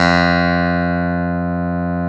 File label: Clavi F3; Sound & Vision; Gigapack I CD 2